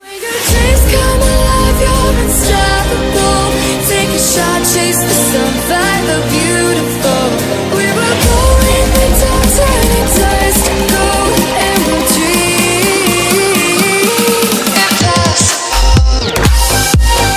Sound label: Huawei Remix